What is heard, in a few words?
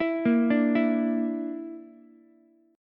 D Suspended 2nd Sharp 5th D Ukulele; 9,10,10,7; arpeggiated